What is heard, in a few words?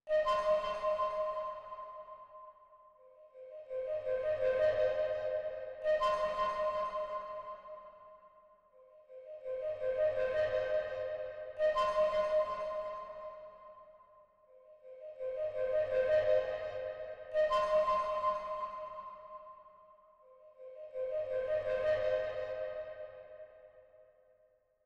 167 BPM F Min Keys; Melody; Reverse; Stems; Cymatics; 2022 Melody Collection (BETA); Omniverse